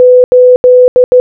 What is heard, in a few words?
Morse Code Audio 8